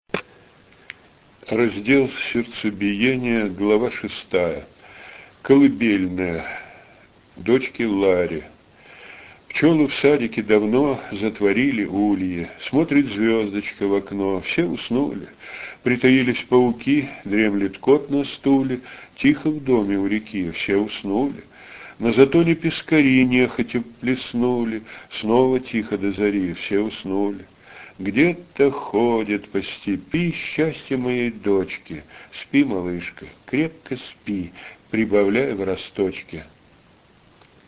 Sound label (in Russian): Колыбельная